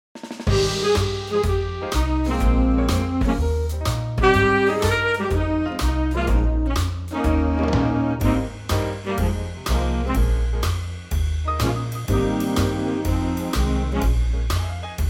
big band; key - Ab; A superbly swinging Big Band arrangement; makes a great foxtrot!

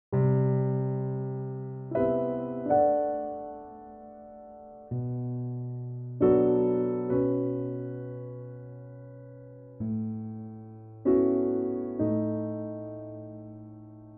C piano; key - C - vocal range - C to F; very beautfiul piano only arrangement; very beautfiul piano only arrangement, no tempo i

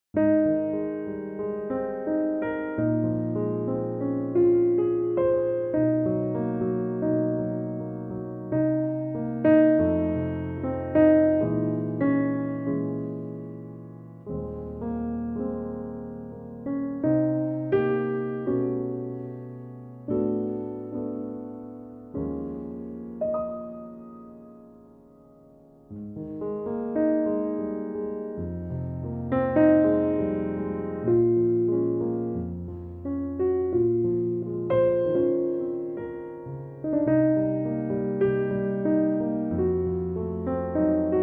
Ab piano; key - Ab - vocal range - Ab to Ab; Pianotrak; Another stunning Piano only arrangement; Laidback,easy to follow

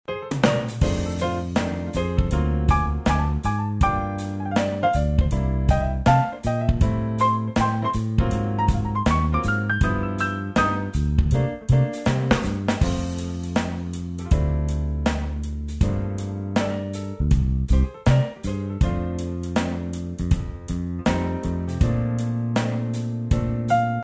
auld lang syne F funky

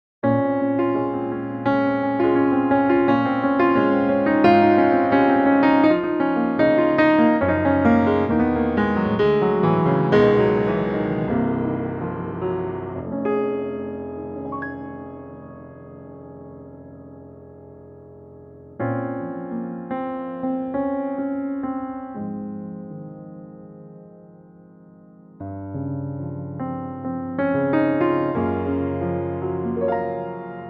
Bb m piano; key - Bbm; vocal range - (Ab) Db to F; Very lovely piano only arrangement

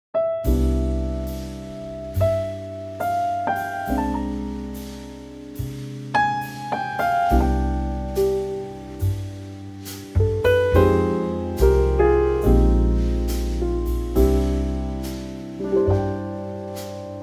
C Trio; key - C - vocal range - G to A; Stunning Trio arrangement of this incredible song

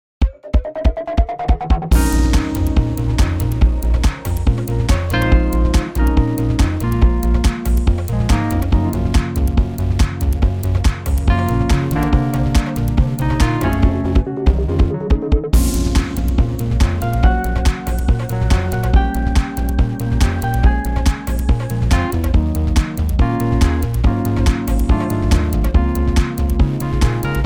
Bbm Smooth; key - Bbm - vocal range - Bb to C

